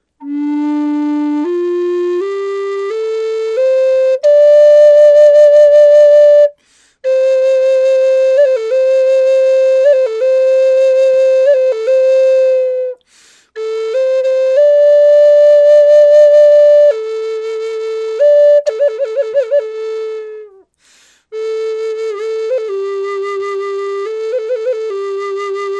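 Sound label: Cedar Classic, flute, woodsounds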